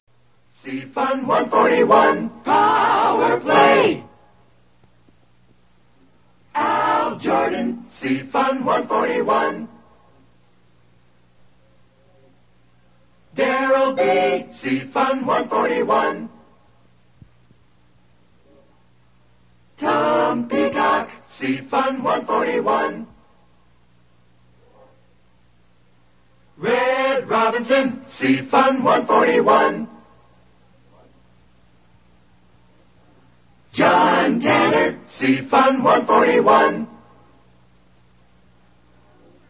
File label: low budget jingles; The "low-budget" series heard from mid-1966 through mid-1967